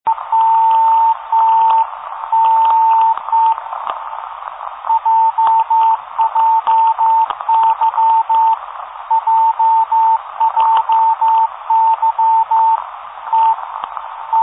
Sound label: jjy; Time